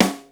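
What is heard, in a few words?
snare tight, Yamaha Motif ES-Series; 300 Drum Machines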